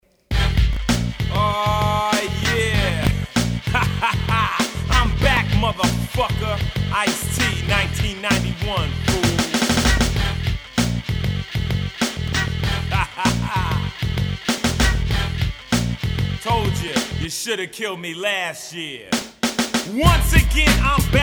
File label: Rap & Hip Hop